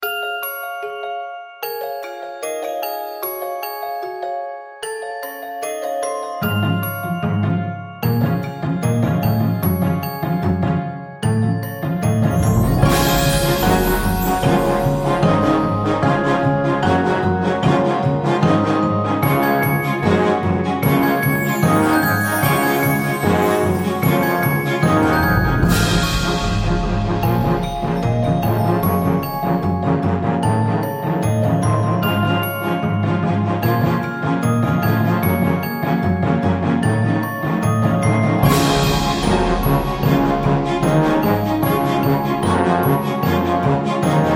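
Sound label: background music without words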